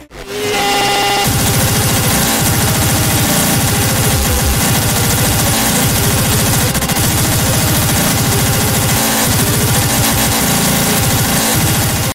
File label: i phone 8 plus noise ringtone